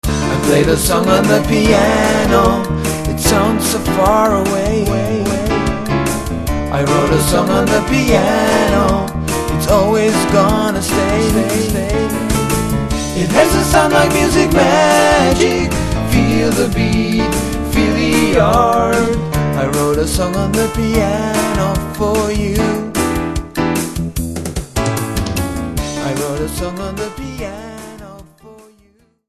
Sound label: piano